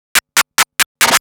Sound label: Scratch Fills 140BPM